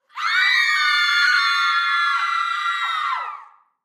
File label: Human Crowd Studio; Crowd Reactions Female Scream Ahh Loud; Sound Effects:Voice